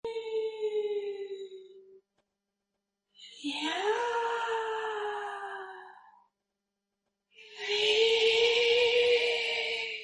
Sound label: horror calling you